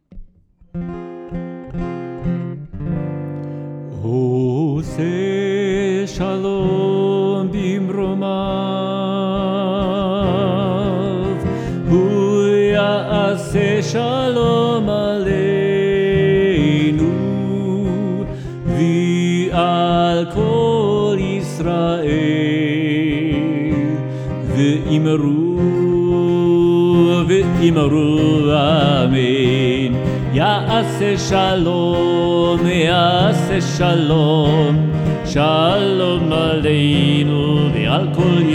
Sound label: Ose Shalom